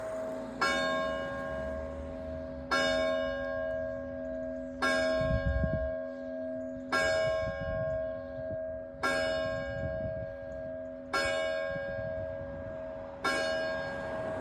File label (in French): Cloche; FONTENILLE 79